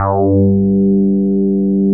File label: BOW BS G3